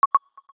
drag fail

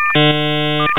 audio 8bit

